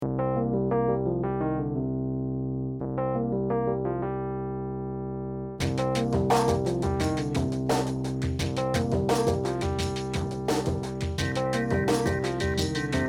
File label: Window Tint, electronic